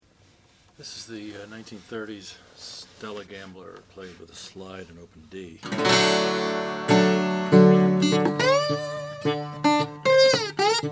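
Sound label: oscar schmidt stella gambler black decal, Guitars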